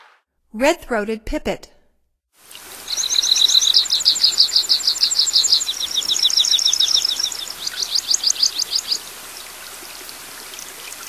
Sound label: Red-throated Pipit, Songs of Birds of North America; Stokes